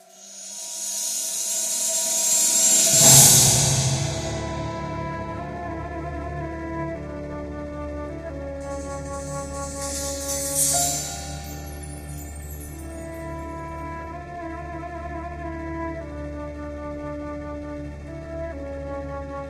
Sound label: Ambience; maelstrom the battle for earth begins